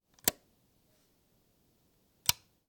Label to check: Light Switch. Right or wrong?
Right